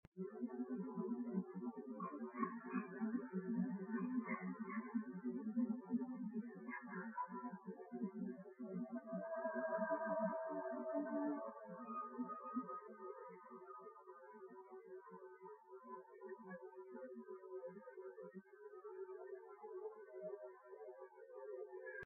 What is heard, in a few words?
yell vocal hi filter